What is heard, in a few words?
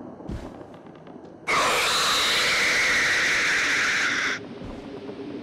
bark